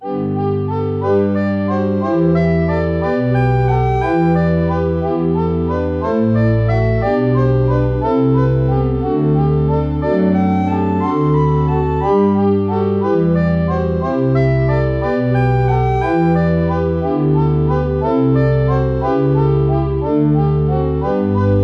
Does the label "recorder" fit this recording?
Yes